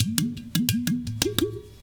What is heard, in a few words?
NIGER UDU; Spectrasonics; Heart of Africa